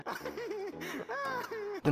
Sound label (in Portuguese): risada de fundo